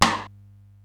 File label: SDS9 RM, Simmons SDS-9, Rimshots